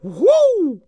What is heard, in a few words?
clown throw; Ringling Bros. and Barnum & Bailey; Wii